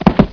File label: land